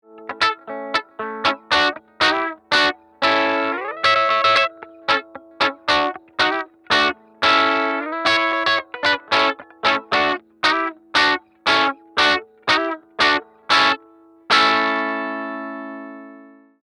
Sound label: 335 bottom inst channel